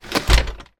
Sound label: household door front close careful gentle; zapsplat